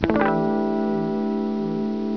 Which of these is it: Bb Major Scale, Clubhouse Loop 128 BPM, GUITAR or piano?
GUITAR